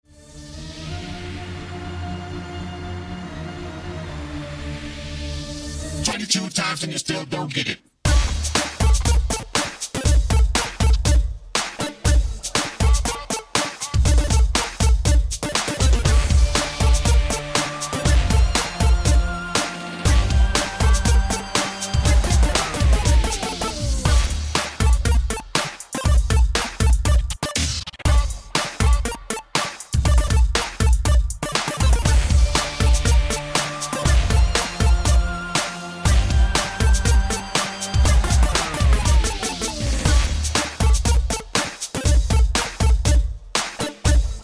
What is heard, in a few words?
Routine Set MUSIC